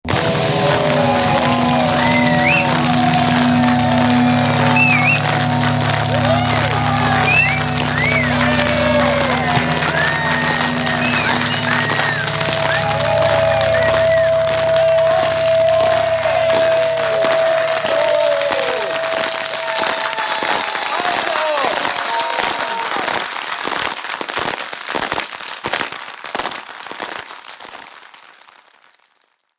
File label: Crowd applause while shouting ‘more’ and ‘Encore’ with electric guitar nois